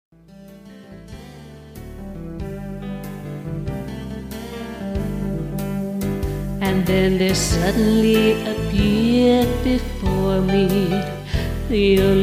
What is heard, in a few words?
Jazz